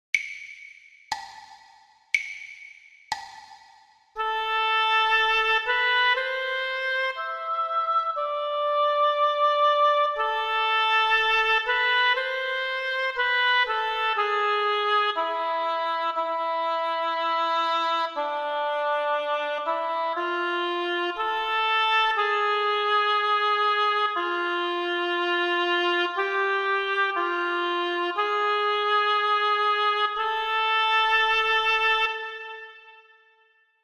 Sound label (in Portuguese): percepcao ditado